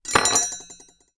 knife down on wooden table

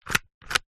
foley slider plastic slide; zapsplat

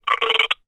human male burp into telephone recorded receiver

